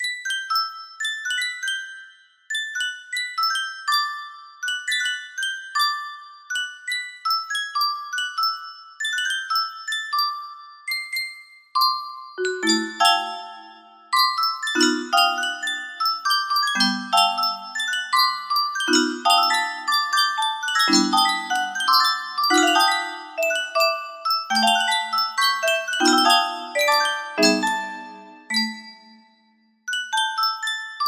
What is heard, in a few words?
Music Box Conversion, music box melody